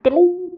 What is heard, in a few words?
Coin Pickup; TileVania